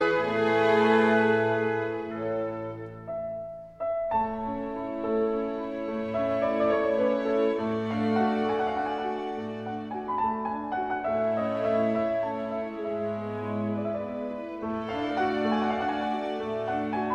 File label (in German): Konzert fuer Klavier und Orchester D-Dur